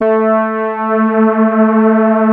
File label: FAT LEAD, Powered DX; Wizoo